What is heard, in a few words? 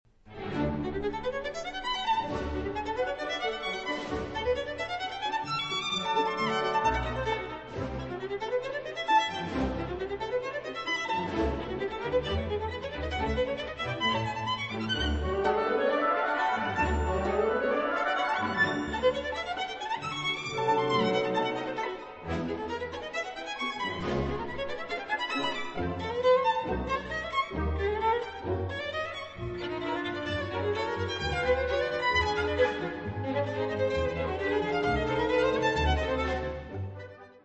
violon Vif Et Leger; Concertante